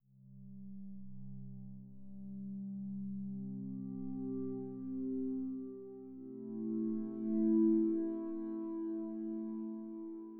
Woodwind Pad 80 bpm, Interstellar Ambience; Zenboy1955

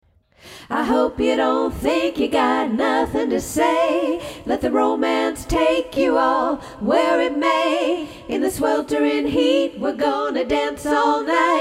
Background Vocals; Free Music Free Beer

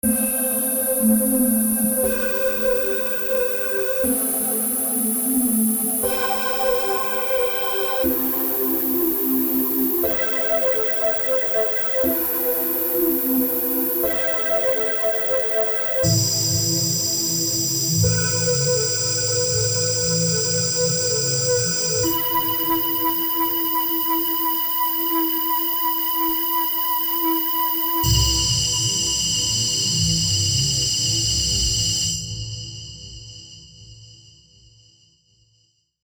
Am120 Rogue Chords

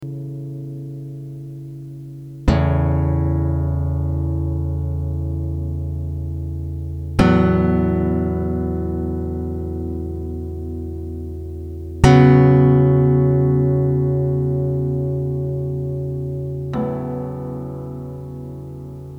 Two Guitars Impro

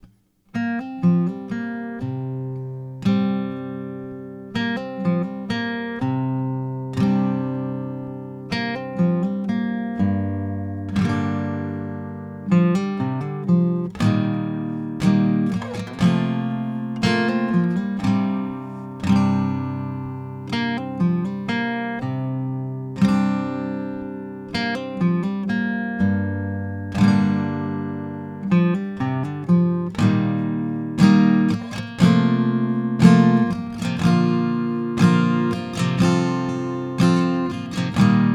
GTR

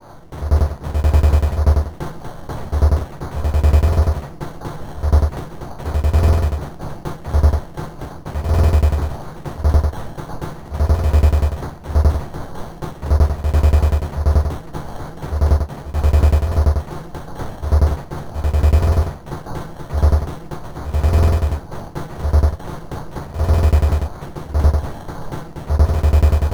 Cm72 Zone Ambience

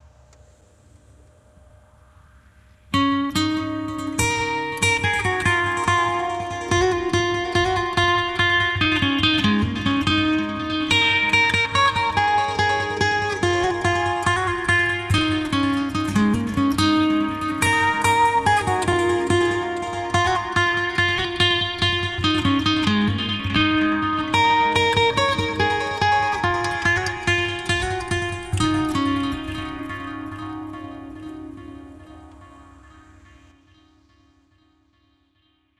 Unknown Folk

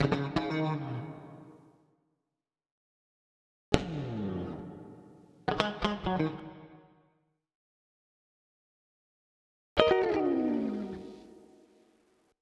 PIGEON FUNK

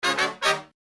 brass loop

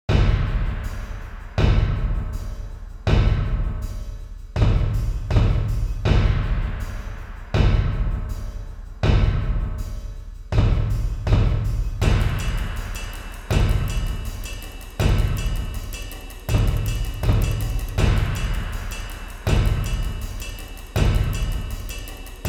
Cinematic Kit

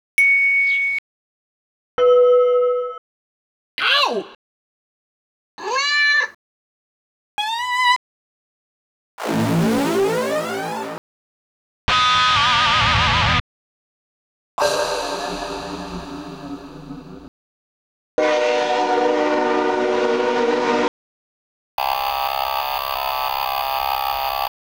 beats; ccMixter

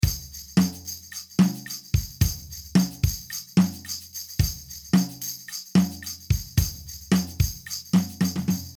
beat

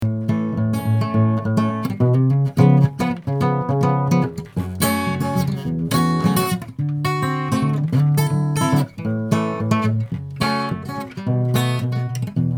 fingerpicked guitar

